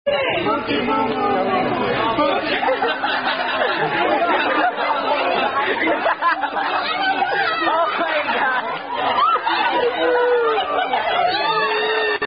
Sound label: Boom Chicka Boom Boom Boom Boom, Gesture Circle Game